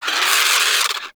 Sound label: Avichaea Vocal Alerted; Hunting Critters